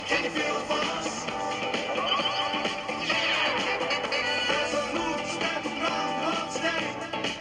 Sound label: Dart